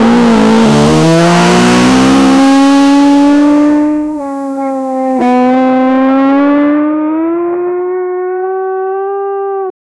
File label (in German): formel 1 sound aus dem, 1970s